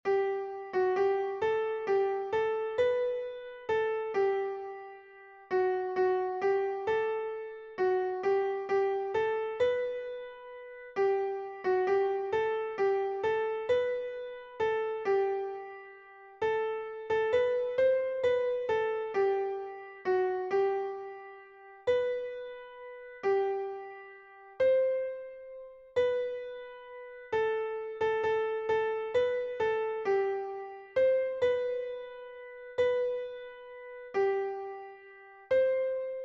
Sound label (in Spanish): SOPRANO Piano, himnos en piano; cantos del camino